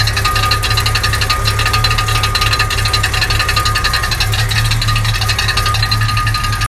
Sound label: ratling torque converter rattle xj